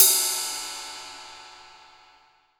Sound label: TIP, 18 ZIL RIDE